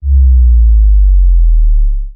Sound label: dropper, Kicks Heartbeats